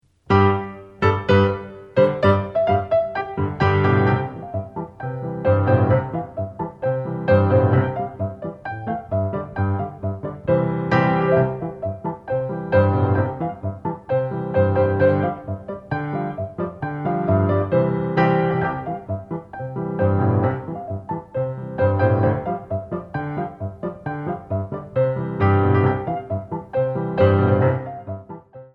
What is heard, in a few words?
frappe